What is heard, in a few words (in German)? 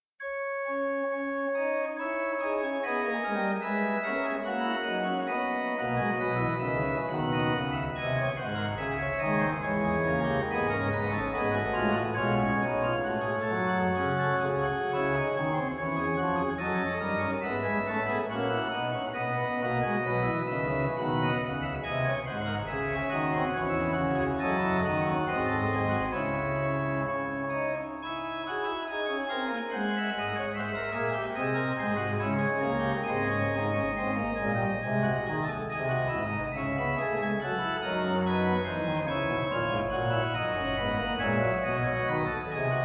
Orgelbuechlein; BWV 613; Helft mir Gottes Güte preisen